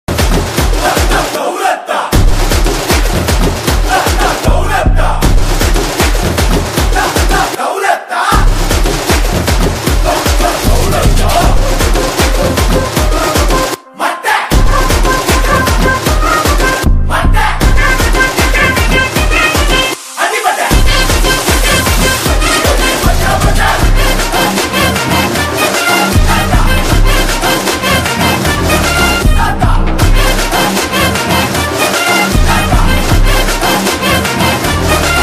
song bgm ringtone tamil ringtone tamil bgm ringtone